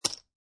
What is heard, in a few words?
Poker Chips Dropping On Poker Chips